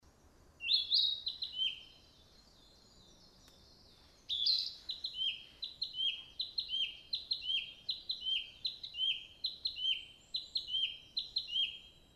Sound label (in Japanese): kibitaki